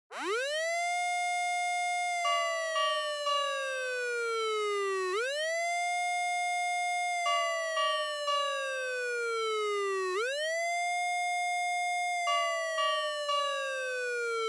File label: Fire Truck Siren Dry